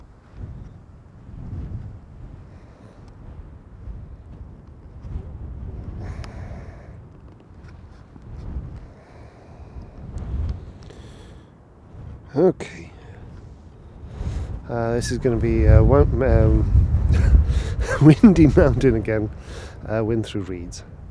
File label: mountain wind through reeds